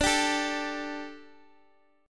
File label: D Suspended 4th D